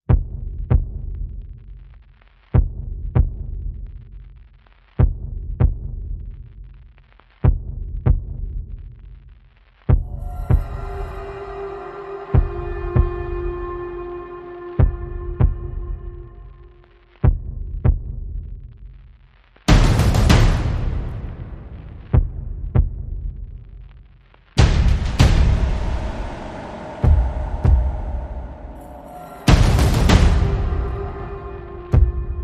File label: Percussion and FX